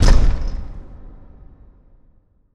Symbol Fall; netent

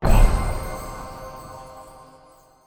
bonus hit new; netent

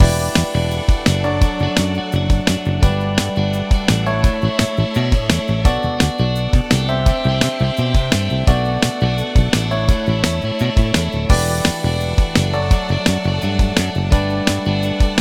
ambience Free Spin; netent

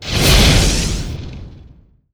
swipe Bonus Game; netent

